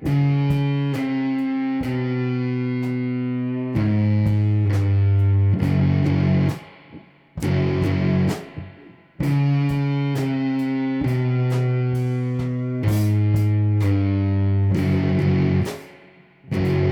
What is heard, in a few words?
Metal 2 Bar Riff